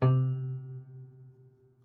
Prepared Piano

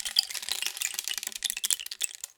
Rain Stick, Percussion Samples

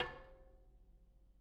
Bicycle Spoke

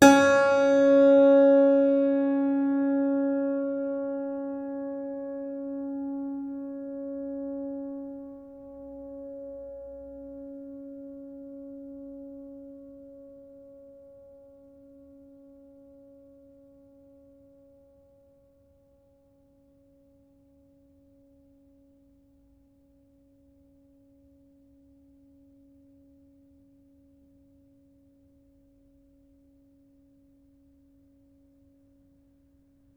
String Pluck Ped, piano; Piano Miscellaneous

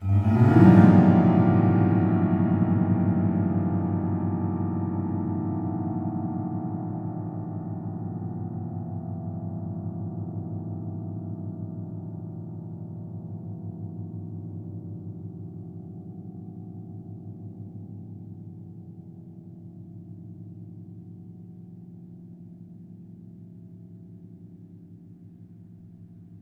String Brush, piano; Piano Miscellaneous

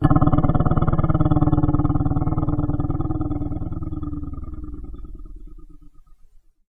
Vibration, Kitchen

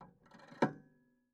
PayPhone

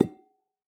Palm Glass, Medium Wine Glass

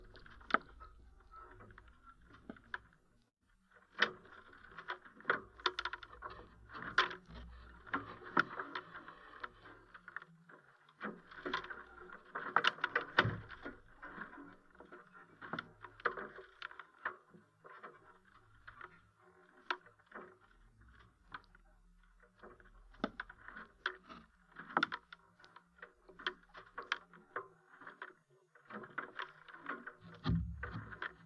Sailing

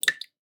Drips

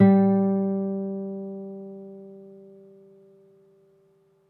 Open, Classical Guitar

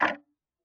Tick, Clocks Kitchen Clock

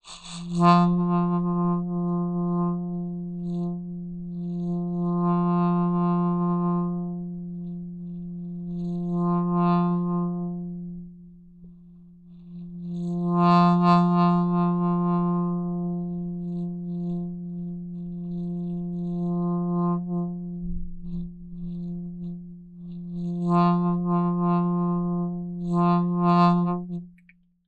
Barely There Note, Melodica

